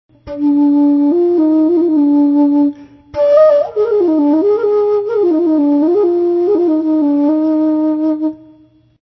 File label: flute